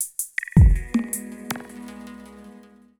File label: PERCUSSN, Ambient, Drum